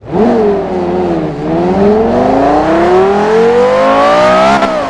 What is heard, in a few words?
first, vehicles; carreragt